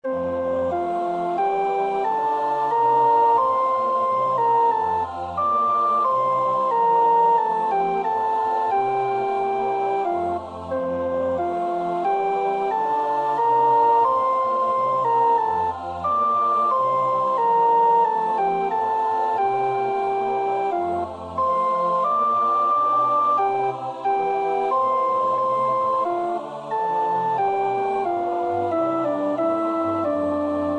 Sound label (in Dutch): sopraan; Nieuw Liedboek; NLB 909 Wat God doet, dat is wel gedaan